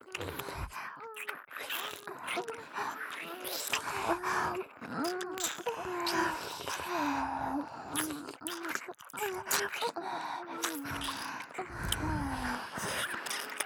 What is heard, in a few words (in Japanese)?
両耳舐め+ディープキス, ASMR; KU100; ハーレムASMR